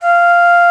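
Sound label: alto bansuri, World Winds; E-MU Producer Series Vol. 7 – Old World Instruments; World Combos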